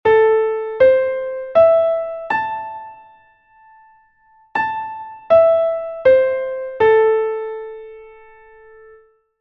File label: minor triad; Essentials of Music Theory: Elementary